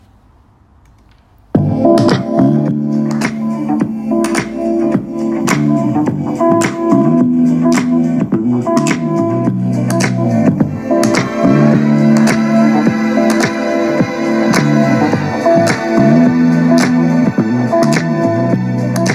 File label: beat jungle